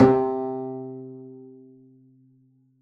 pizz c3, double bass

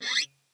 scrape, glowly bass

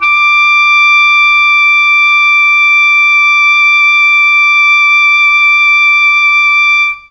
D4 ALTO SAX, mellotron; CHMB ALTOSAX

